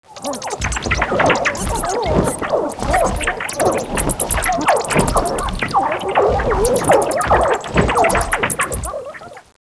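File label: air combat; MIDGARD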